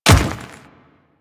Dirt Step, Footsteps; Warframe; Grineer Royal Guard

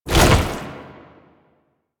Tank Infested Arm Move, Movement; Warframe; Lasrian

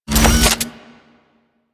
Flak Cannon Reload; Warframe; UT Flak Cannon

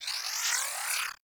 Charge Up, Weapons; Warframe; Moa Pet; Vulcax

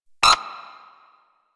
Sniper Mech Low Ammo; Sentient; Crp Sent Amlg Sniper

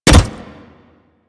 Pet Step Default; Warframe; Alad V Pet

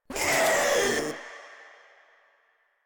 Runner Pain; Enemies; Warframe; Proto Infested

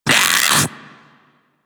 Infested Splitter Split Top Vocal Pain

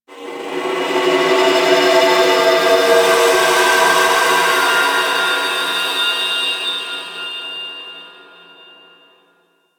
Moon Texture Medium, Ambience; Warframe; Orokin

